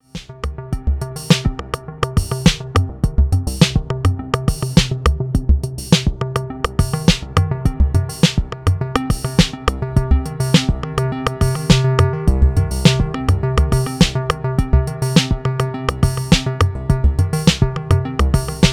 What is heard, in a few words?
analog bass